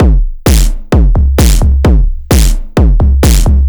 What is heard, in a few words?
Distorted Drum Beat 130, distorted drum loops 130bpm; DD Beat DOA